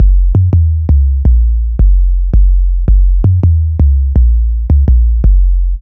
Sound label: Standard bass loop 165bpm G1, Drum&Bass; Total Drum&Bass